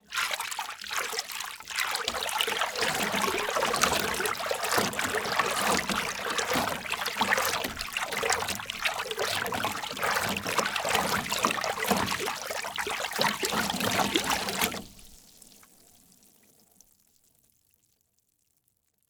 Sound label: Liquid Water Movement In Plastic Bucket Finger Swirling Deep